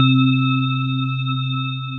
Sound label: Largesse Chime; Instruments; Wavetable; Mallets; Ableton; Synth Essentials